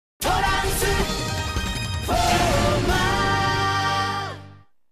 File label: Commercial Bumper Sting